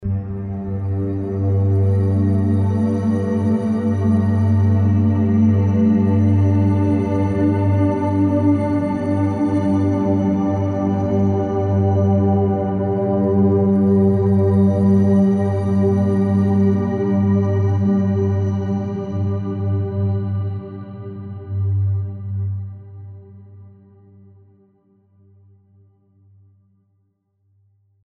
pads

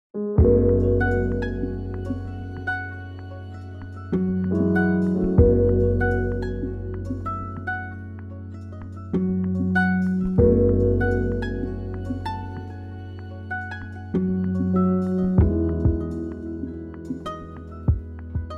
balalaika

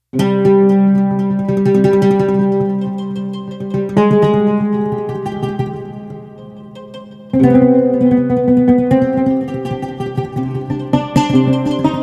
oud trem

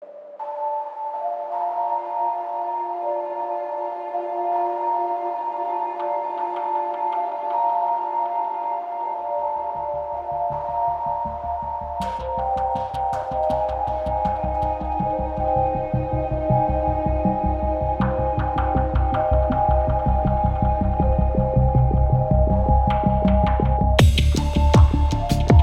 violin; CINEMATIQUE

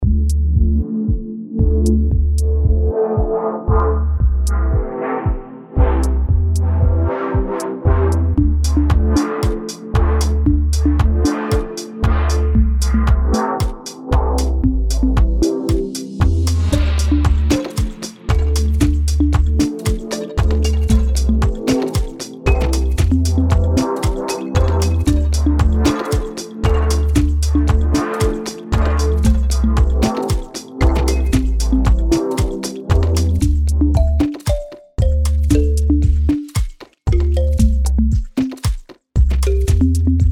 MELLOW HEART; The mellow heart of the orchestra glues everything together. We recorded some of them: hor; CINEMATIQUE; KLANG

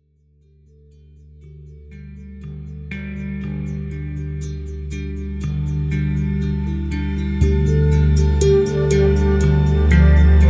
lap harp

